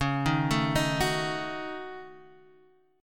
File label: A 6th Flat 5th C sharp, guitar